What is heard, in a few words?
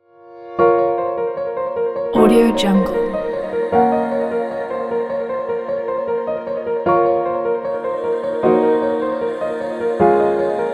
melodic future bass; synthice